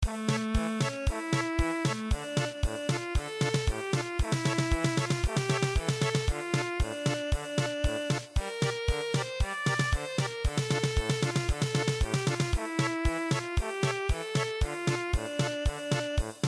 Clap Ran Tan Tan German Polka

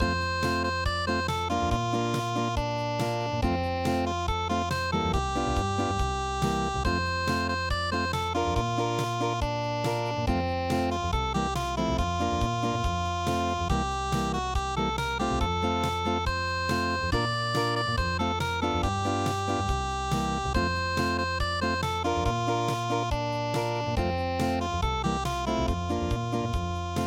unpluged, midi; What A Friend We Have In Jesus

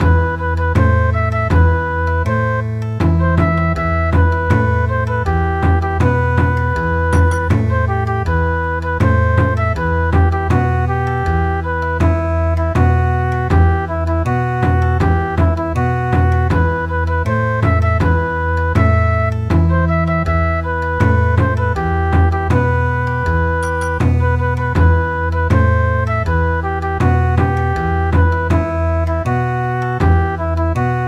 Bon Odori; CHUUGOKU CHIHOUNO KOMORIUTA

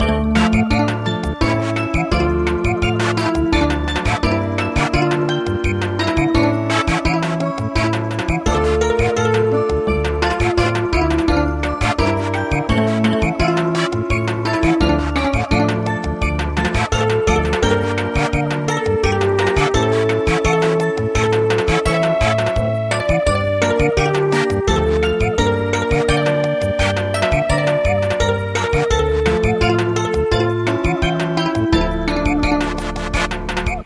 Samba

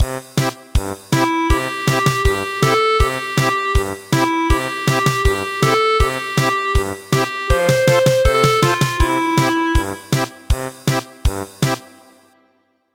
German Polka

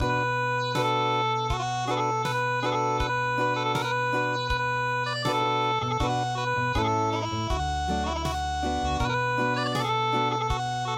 Acoustic; Chinese Chatsumi

